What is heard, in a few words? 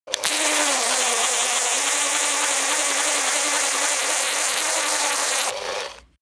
servo hum oscillate long